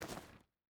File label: footstep hide stones walk; Rust